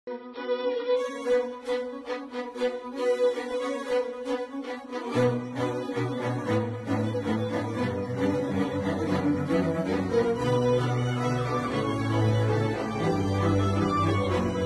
movie violin music violin ringtone bgm ringtone bgm; varshangalkku shesham